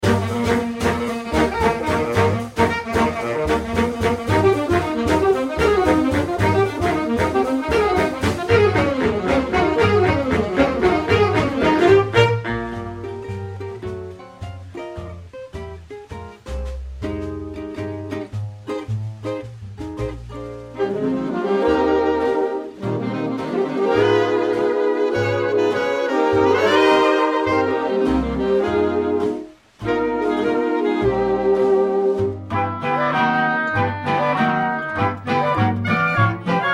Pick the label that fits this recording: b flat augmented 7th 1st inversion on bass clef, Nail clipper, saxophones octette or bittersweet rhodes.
saxophones octette